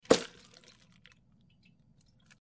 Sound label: Mud Plops Movement